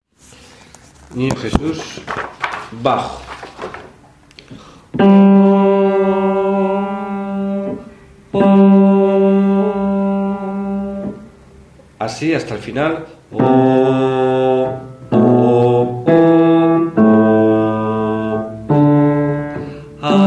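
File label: bass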